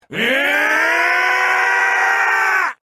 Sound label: scream; gogeta